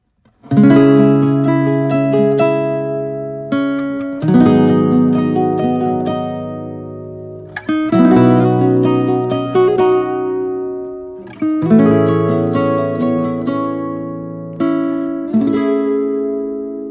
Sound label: music sad